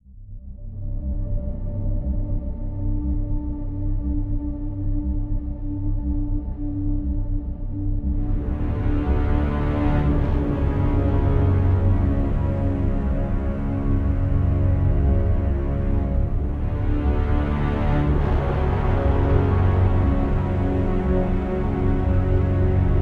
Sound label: Music theme